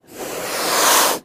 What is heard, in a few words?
Ocelot Hiss Medium